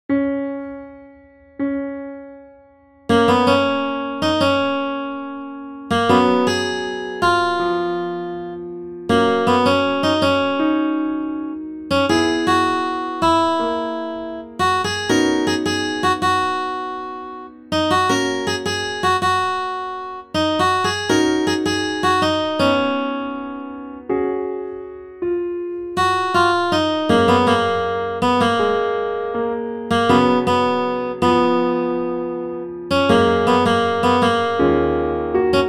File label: accomp melody